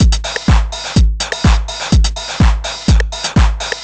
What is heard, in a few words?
drum breather full 125, Classic Chicago House Drum Loops